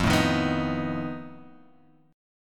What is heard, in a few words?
F 7th Flat 5th F down Guitar Open G